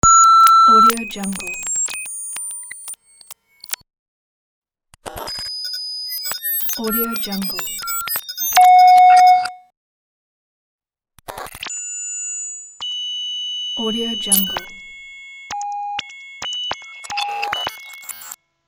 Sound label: micro system signal